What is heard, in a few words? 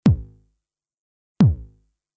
TR-909 BD Mod Thump, Roland TR-909; Synths